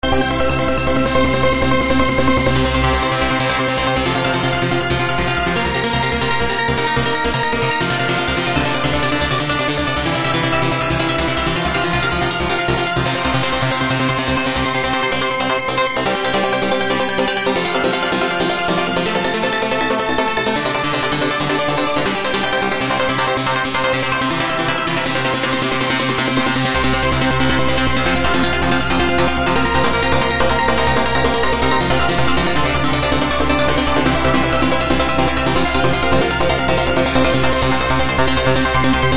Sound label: spring melody